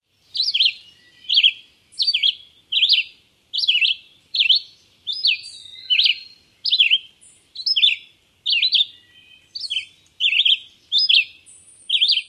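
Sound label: red-eyed vireo fast song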